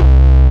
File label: Single Acoustic Basses